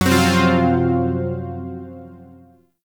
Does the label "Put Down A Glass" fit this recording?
No